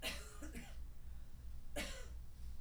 Mom Coughing; Running Errands On A Rainy Day

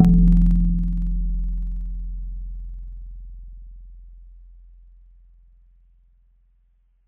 Mallet C; Synthetic's Serum Collection; Surge Sounds - ASTRO - Serum Wavetables

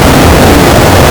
Jazz; lolicore

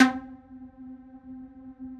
Pluck C3; Synthetic's Serum Collection

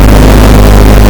Drum Spoiling; lolicore

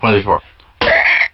Bouncing Yoga Ball

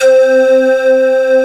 flutey; ESW Sphere

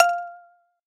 Mallet, Noises; HYPERBITS

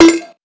Bottle, Noises; HYPERBITS

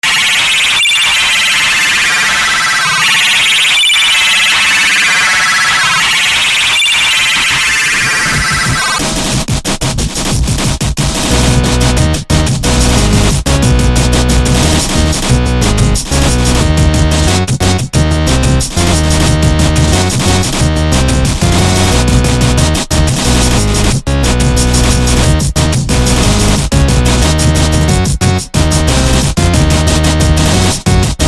techno; lolicore

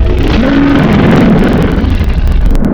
Gorilla, Animals for Serum Wavetables; Synthetic's Serum Collection; Cymatics - Animals for Serum - Gold Edition Wavetables

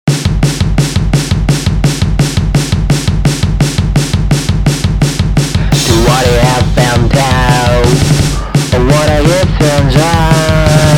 Love Song

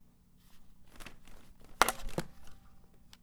Unplugging Guitar

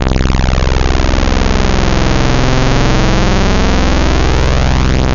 Saw Fifth; Synthetic's Serum Collection; ESW Core Wavetables; Echo Sound Works Core Tables

